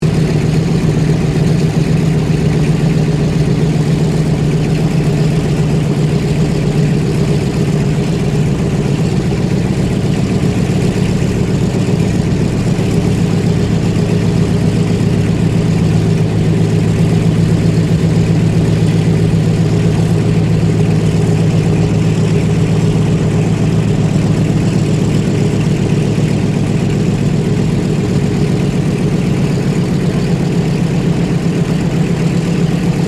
Aircraft Douglas DC3 Idle Loop, Douglas DC3 twin engine propeller plane; 1945 Douglas DC3 twin engine propeller plane